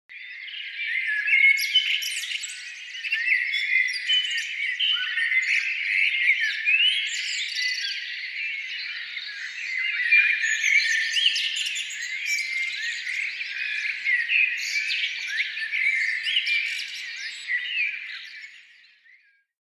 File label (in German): zwitscherbox; relaxound